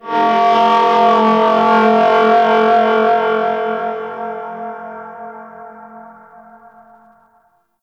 Temple Chant Texture; Ambience; Corpus Ship; Temple One

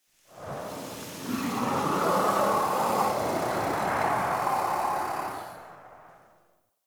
Ext Wind Gust Calm Toxic Throat; Warframe; Infested Eris

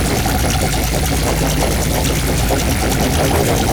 Beam Loop, Archwing Primary; Warframe; Larkspur Prime

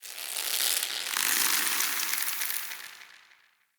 vine creak; Ambience; Under City

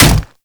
Grineer Manic Claw Hit

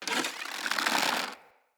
Hunter Bow Ranged Charge; Weapons; Player; Bows; EnvoyHunterBowRangedMelee

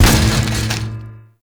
Gatling Gun Debris Impact; Gameplay; Warframe; Tau

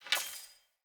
Hunter Bow Ranged Charged Shot Ready; Weapons; Player; Bows; EnvoyHunterBowRangedMelee

